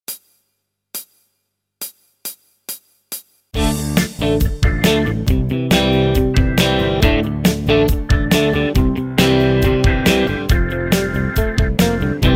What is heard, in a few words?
Str Damping